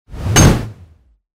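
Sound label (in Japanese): 斧, 武器効果音; モノラル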